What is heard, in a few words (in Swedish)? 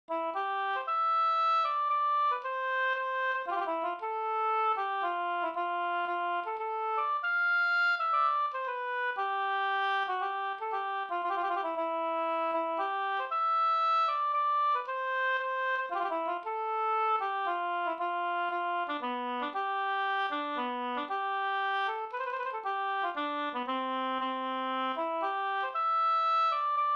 hamburska; Nar jag var brud e Albert Bostrom Sd